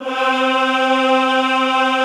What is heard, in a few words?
EHH C3, Classical Choir; Best Service